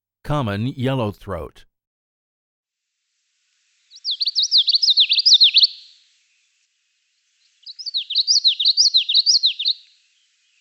Common Yellowthroat, bird calls; Non-Game Birds

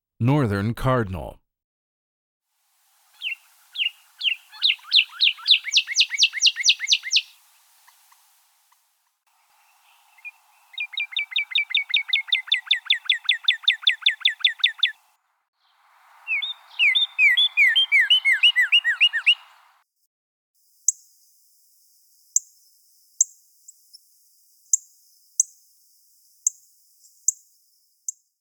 Cardinal, bird calls; Non-Game Birds

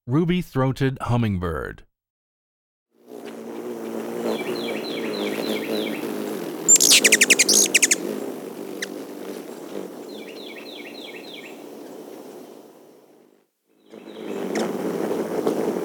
Ruby-Throated Hummingbird; Non-Game Birds

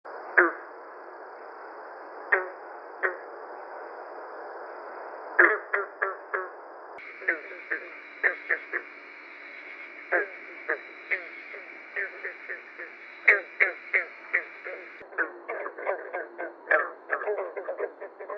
Green Frog

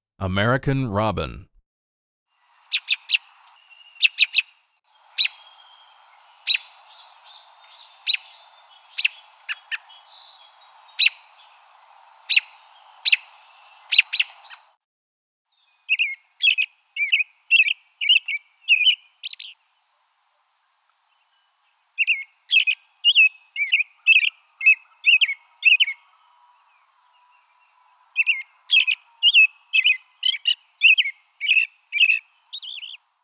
American Robin, bird calls; Non-Game Birds

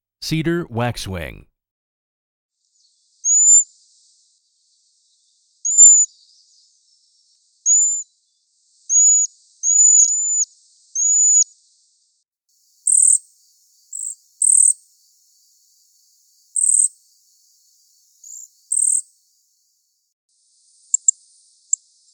Cedar Waxwing, bird calls; Non-Game Birds

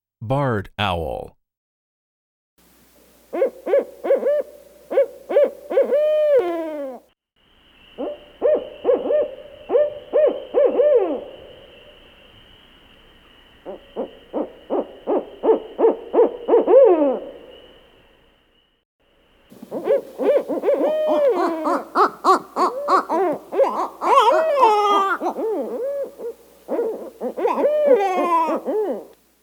Barred Owl

